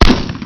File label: M14 Single Shot, gun